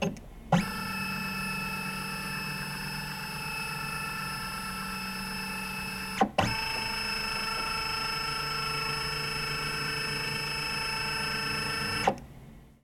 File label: Scanner, Flatbed, Epson, Perfection, V30, Narrow POV; Office